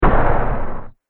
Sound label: Dinosaur Mighty Footstep